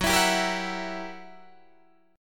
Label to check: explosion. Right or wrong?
Wrong